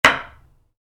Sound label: Wood hit concrete, Wood, Light Impact: Concrete; Materials; Wood Sound Effects; Wood (Sound Effects:Materials); Stuart Duffield